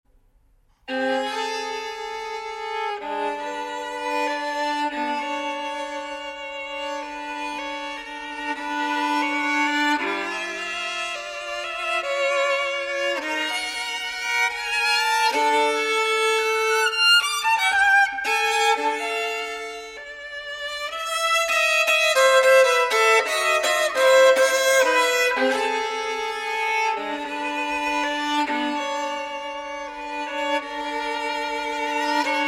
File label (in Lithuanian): Koncertas smuikui solo; Balsys